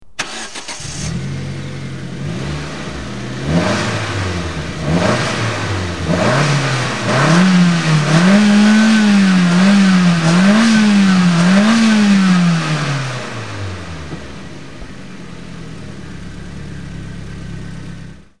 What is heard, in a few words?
Focus RS, ford